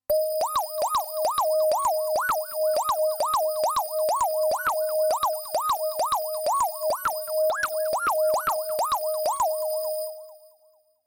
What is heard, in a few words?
Synth warble portamento, XV-88